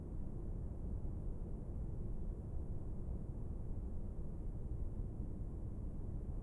BG Urban Busy Window; blue archive

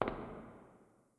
Foot Step; blue archive

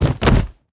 Fall; blue archive

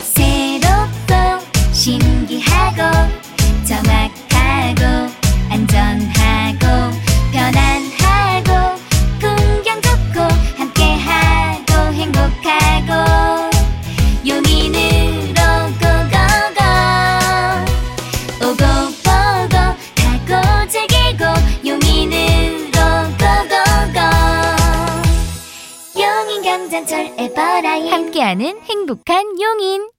logo song; yong-in everline; Train Announce

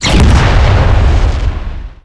Robot Hit; Kaiten Robot; blue archive

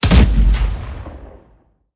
Artillery; blue archive

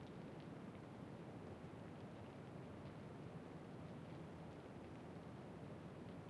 Window Rain; blue archive

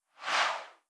UI FX BG Scroll; blue archive

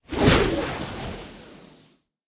Rappel; blue archive

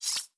Loading; blue archive

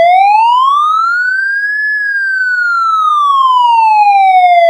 wail, sirens; code3 excel

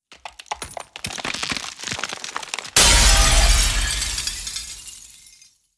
Clink; blue archive

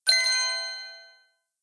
Chime; blue archive

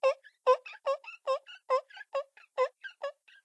Wiping Glasses; blue archive

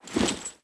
Gear; blue archive

